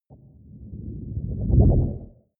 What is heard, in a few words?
fly by wobble ship short; swooshes-swishes